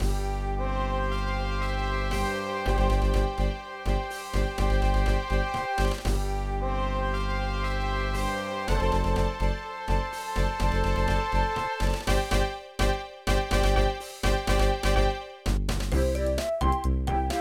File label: BGM Opening